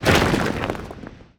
onager hit stone

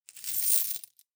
alarm create worker; interface

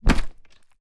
pike attack

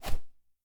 arrow fly

